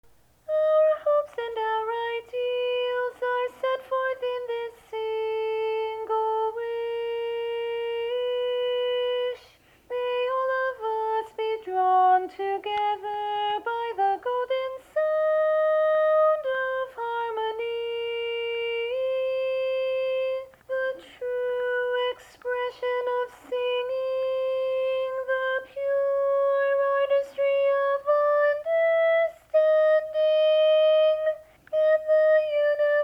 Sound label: Voice of Harmony Tenor Only